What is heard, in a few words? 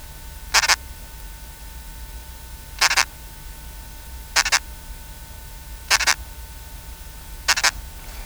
synodontis stridulation